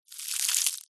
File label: nature ice freeze object timelapse quick; zapsplat